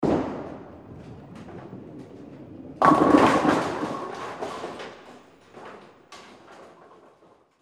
bowling ball hits all pins

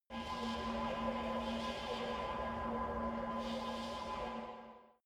space alien fabric machine

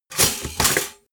toaster finished

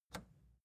record player power push off

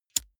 lighter wheel spin

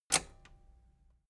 metal button robust spring push enable

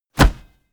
basketball hit basket

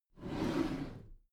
packed drawer smooth slide close

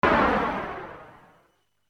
impact

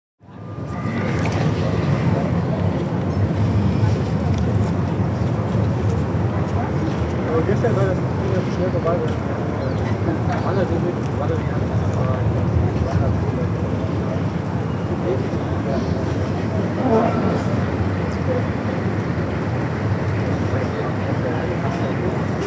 city atmosphere

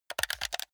console controller play sequence short fast

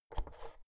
oven knob press in

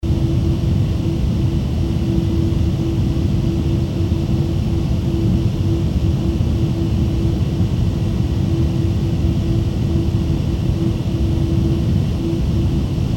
cooling fan noise warm noisy

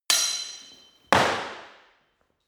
sword hit sword tackle

